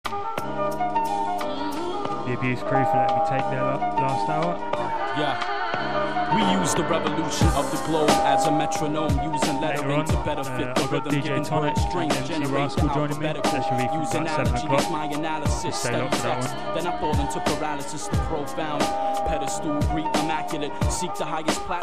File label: Drum and Bass Jungle, UK Bass Radio; Port 80 stream